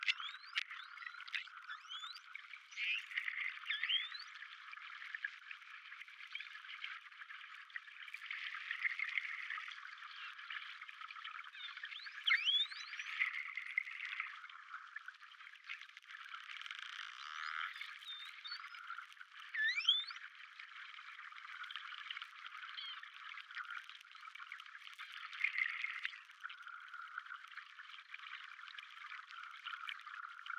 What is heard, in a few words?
voc groupe